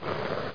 crawl, mummy; Hexen II

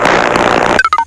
Death By Lightning; Koji the Frog 2.0.1

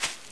player grass; Group Mapping Project

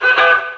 menu No; bombermania

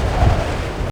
wing

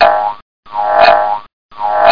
bounce; DR BRAIN; BUGS

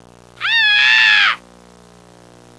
scream; Sound Library 2000; Wayzata Technology

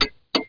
TURN SIG

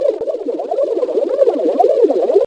ALARM; Far Gate; ENGLISH

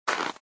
walk ice; penguin dinner

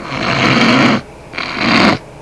snort; People; Sound Library 2000; Wayzata Technology

